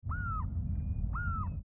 clamator glandarius; cuculidae; calls

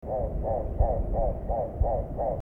tauraco corythaix, birds calls; musophagidae

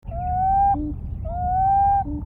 sarothrura rufa, birds calls; rallidae

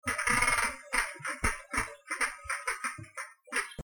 guttera edouardi, birds, calls; numididae

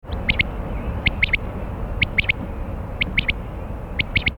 coturnix coturnix, birds calls; phasianidae

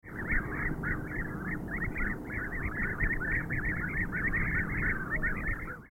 merops apiaster, birds calls; meropidae

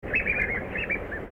urocolius indicus, birds calls; coliidae

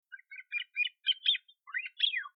scleroptila africanus, birds calls; phasianidae

